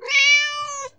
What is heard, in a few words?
meow; SuperPower 2 Redux; chat